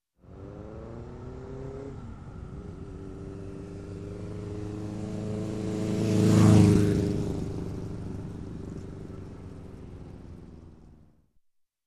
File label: Motorcycle passing by